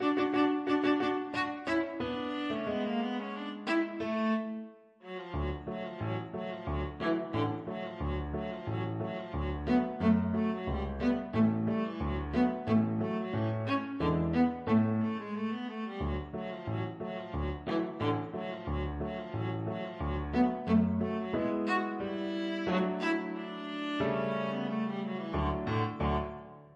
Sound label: radetsky march, viola